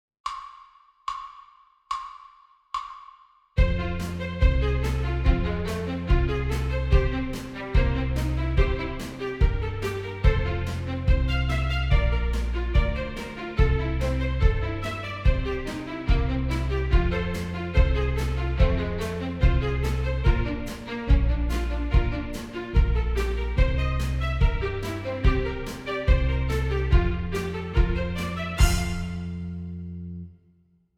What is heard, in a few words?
Horns in Fifths; Brightly Shining